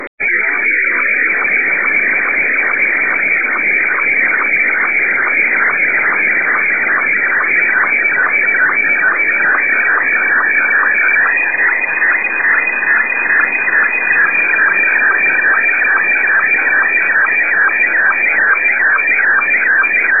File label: sstv